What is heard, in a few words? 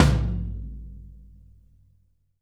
tom, drum